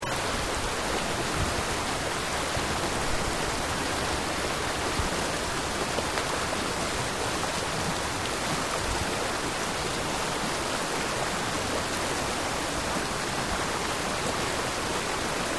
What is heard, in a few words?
rushin river